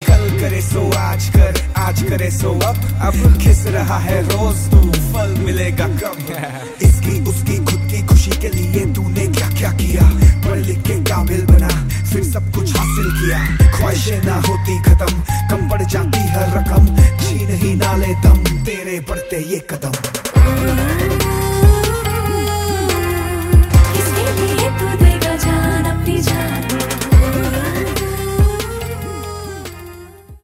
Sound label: Duet Male and Female